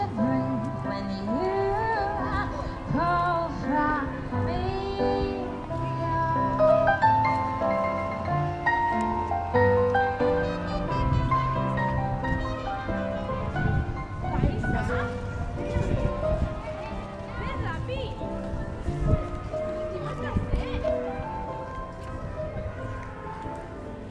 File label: musical clash outside the musee d'orsay